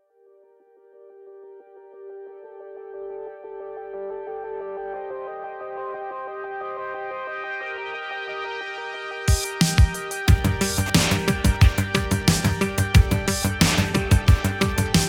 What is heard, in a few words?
Intro Music